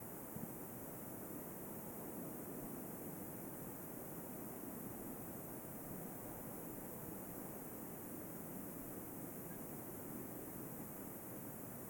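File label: ambience China 3D ambience; Rabbids Multiverse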